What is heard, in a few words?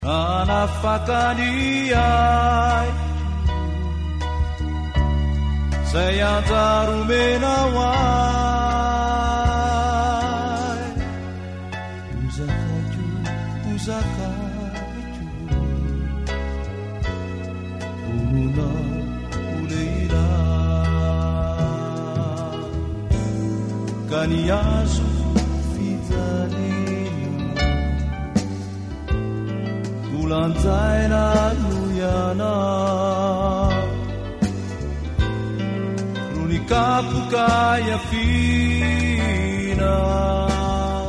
Solo